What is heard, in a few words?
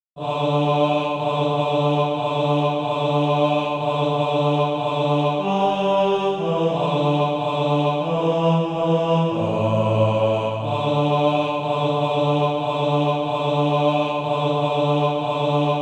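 bass, Practise Music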